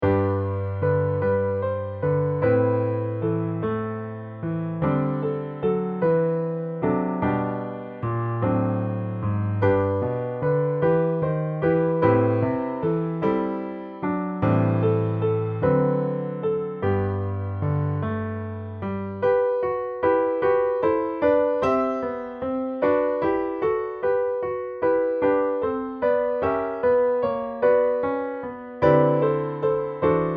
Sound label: Piano; Wonderful Words of Life; piano solos